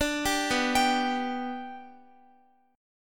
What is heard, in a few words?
B-Minor Sharp 5th, Guitar; arpeggiated